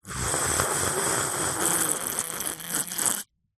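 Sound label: slurping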